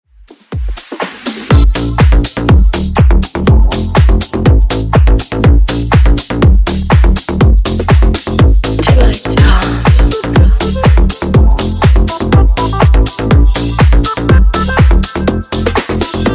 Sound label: Funk Remix 126Bpm